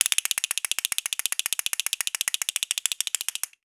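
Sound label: SMALL CASTANETS; Voice Crystal - Steve Reid Definitive Percussion; CASTNT+RATTL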